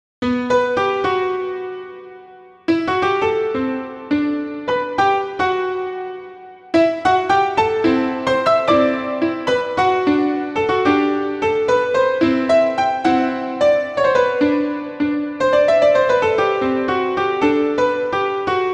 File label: sample piano line